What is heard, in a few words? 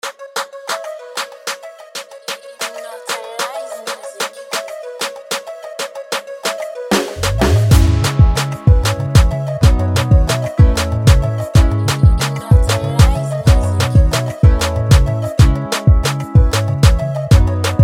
Afro Beats